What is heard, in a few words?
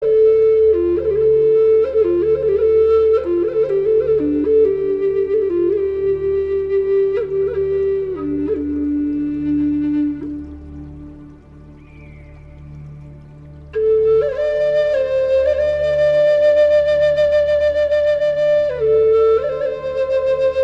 Native Flute